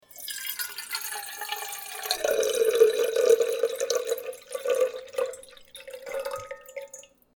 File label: kettle